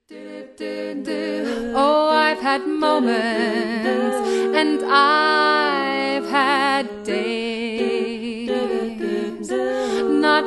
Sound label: thunder